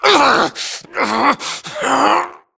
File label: grunt Struggle; Titanfall 2